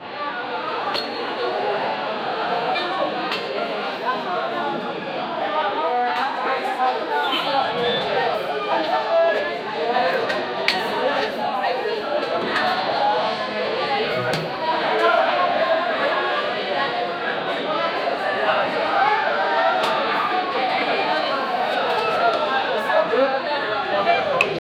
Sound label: ballroom formal in 1860s; historical